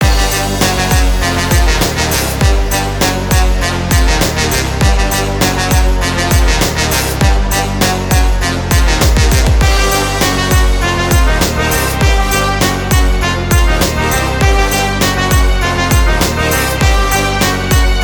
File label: Jazz Clubbing